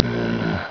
HUMM; BattleSNDS

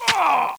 BODY SPLAT

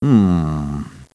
humm; BattleSNDS

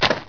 LEAVES

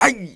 hit; BattleSNDS

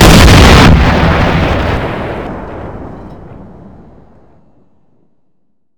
ROCKET 66mm LAW

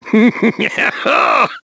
LAUGH; EN; BattleSNDS

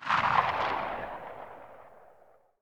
echo dense

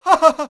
LAUGH; BattleSNDS

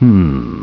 humm; BattleSNDS

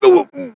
HIT; BattleSNDS

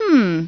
humm; BattleSNDS

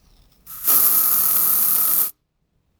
PEPPER SPRAY, Weapons

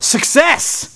LAUGH; BattleSNDS

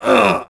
hit; BattleSNDS

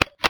lever-action

